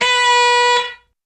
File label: Single Car Horn Honk